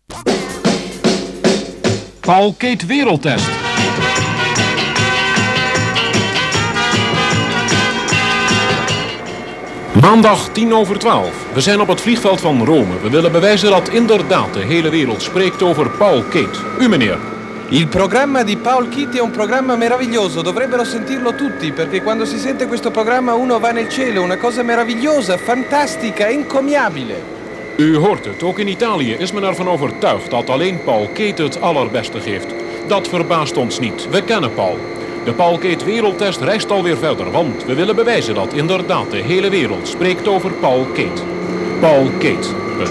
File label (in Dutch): jingle Uit in bed